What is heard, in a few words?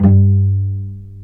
Vcs Pz dry; STR Vcs Marc-Piz